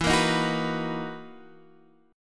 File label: B Major 7th E; BM7/E Chord {X 7 8 8 7 7} strummed